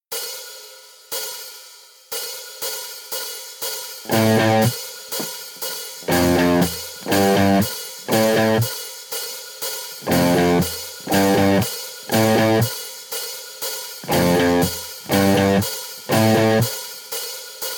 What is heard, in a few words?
Riff One Notes, guitar